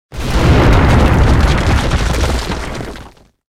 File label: tanks explosion concrete; War Thunder